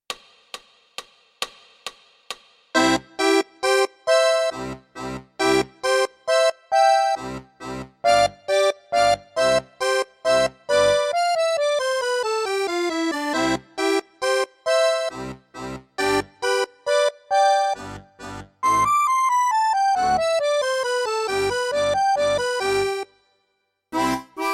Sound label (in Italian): Basso Tuba; Czerny BS in semicrome